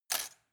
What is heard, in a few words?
cutlery plastic drawer place